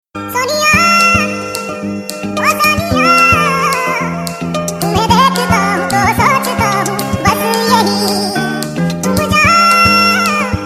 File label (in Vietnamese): em be hat tieng han quoc